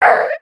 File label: middle damage, voice